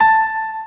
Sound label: piano 440Hz